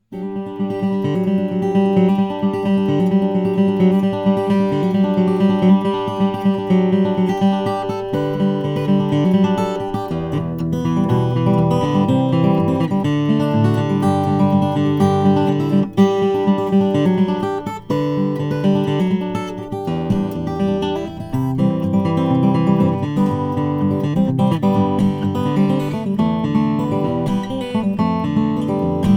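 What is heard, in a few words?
om cuban mahogany, guitars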